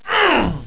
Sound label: fall; ratamahatta